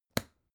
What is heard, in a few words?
folder clamp close soft